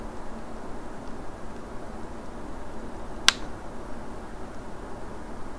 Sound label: snapping shrimp, salt marsh